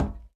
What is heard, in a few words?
plating, Footsteps; civ13; civ14; Civ Effects